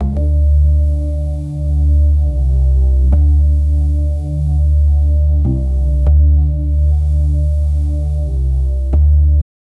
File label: bass drz drz screech; musical